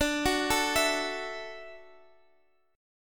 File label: E 7th Suspended 2nd Suspended 4th D